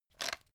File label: pepper mill; soundpool